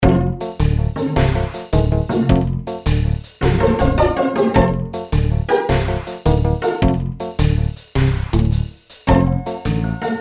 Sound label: Item Shop